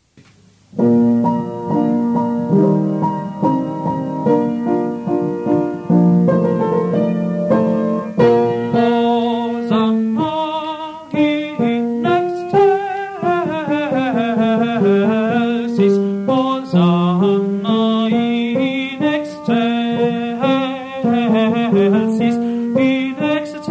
Requiem Benedictus tenori; Mozart

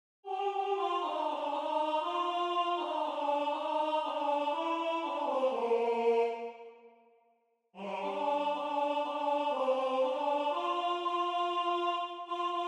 Fermarono i cieli tenori; Santucci; Natale

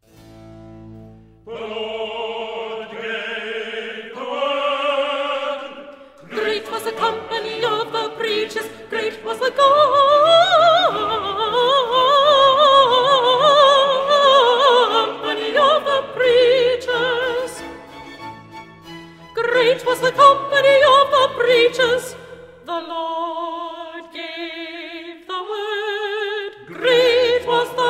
soprani; The Lord gave the Word; Messiah; handel messiah

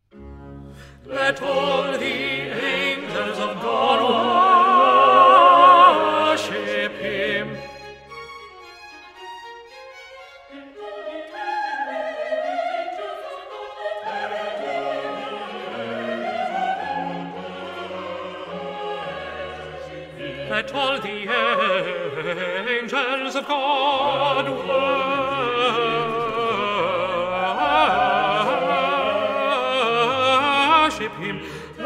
tenori; Let all the angels of God; Messiah; handel messiah

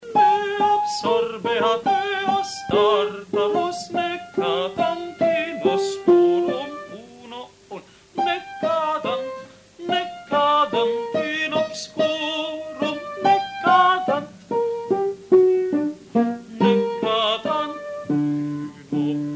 Requiem Domine Jesu soprani; Mozart

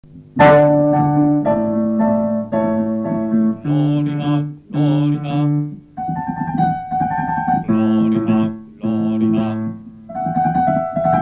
Gloria in excelsis Deo Bassi; Vivaldi

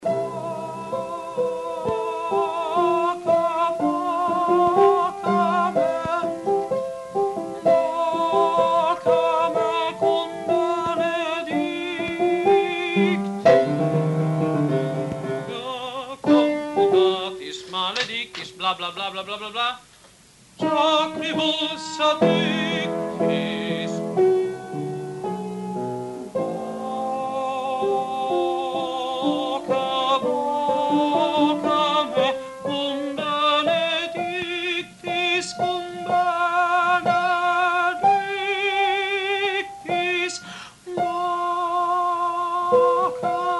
Requiem Confutatis soprani; Mozart

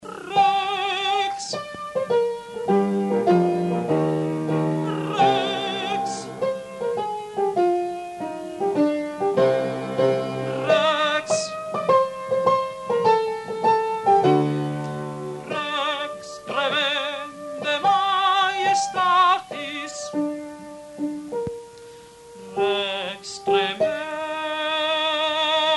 Requiem Rex tremendae soprani; Mozart

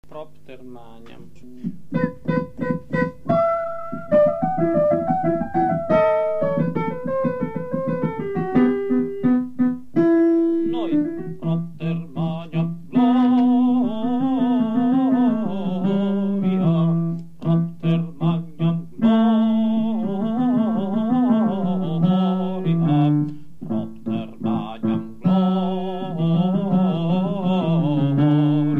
Propter magnam gloriam Tuam Bassi; Vivaldi

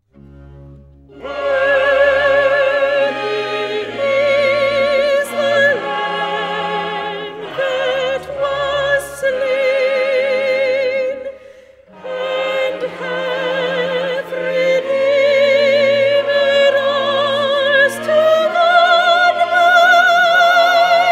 soprani; Worthy is the Lamb that was slain; Messiah; handel messiah

